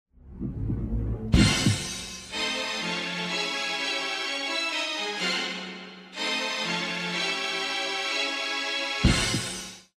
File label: round ten sec count; music kits custom